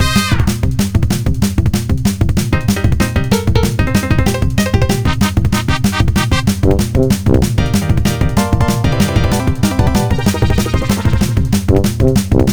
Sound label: Click That Chest; trombone; Trombone Champ; soundtrack collection vol. 1